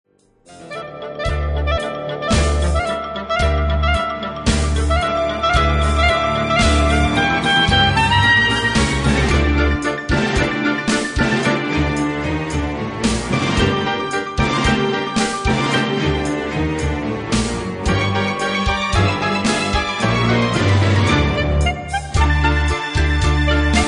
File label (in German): zwischenmusik playback